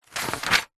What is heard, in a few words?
household book wordsearch magazine page turn fast hard; zapsplat